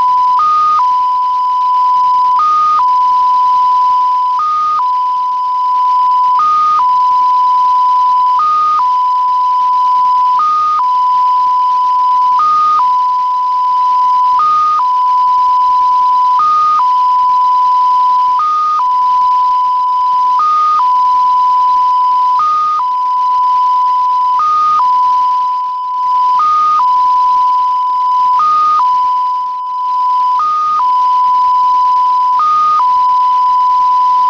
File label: usb; 16167 kHz